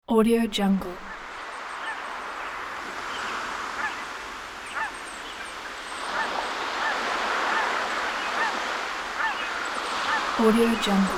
ocean shore with birds

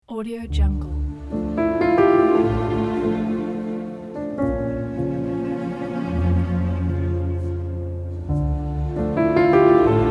emotional horror piano

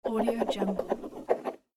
sign a document

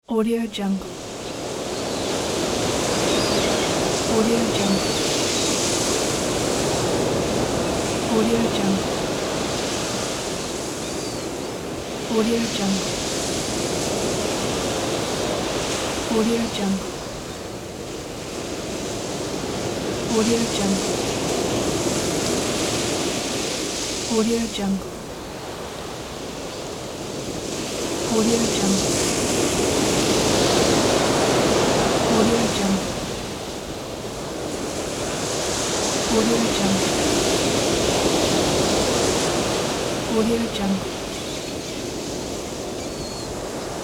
sea ambience

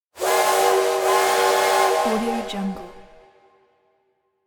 steam train whistle

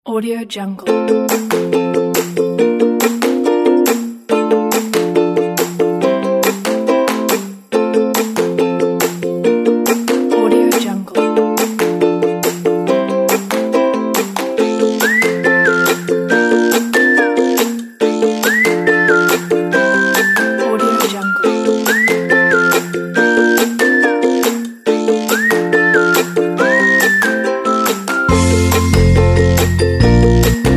whistle and ukulele

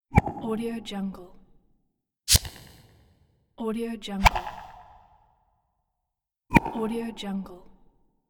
hispeed scifi flybys; lokohighman

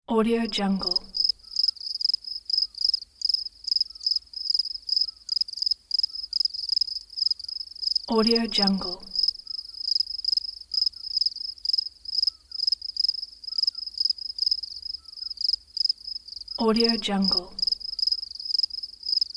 crickets; worldwidesounds

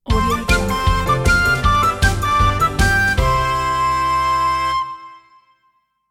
upbeat victory recorder logo

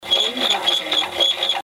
rusty pulley machine loopable

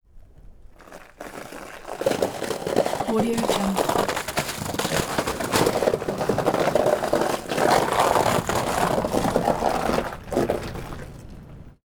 ice cracking; prosoundfx

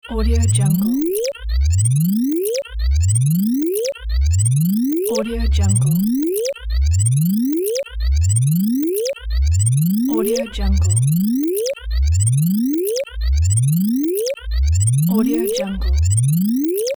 alarm whine and beep

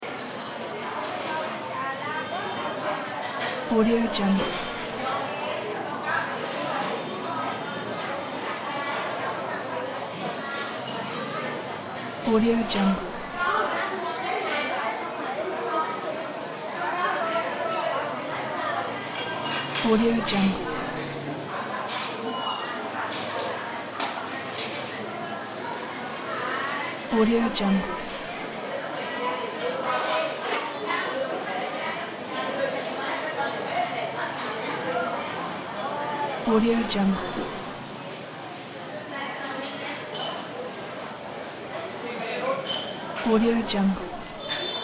indoor market ambience; blazznet

